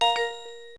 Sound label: cam stop; LG; SH400 Alibi